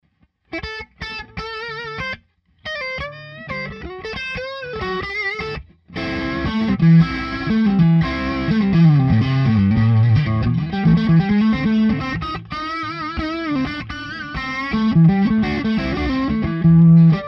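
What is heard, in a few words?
Neck Pup, guitar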